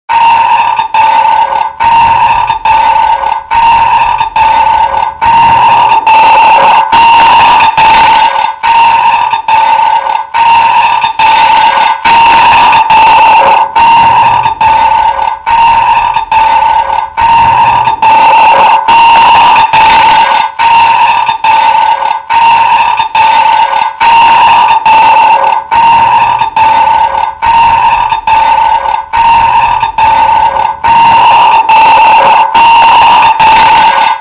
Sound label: Metal Distortion